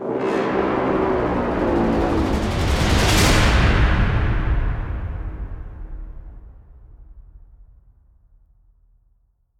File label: Stinger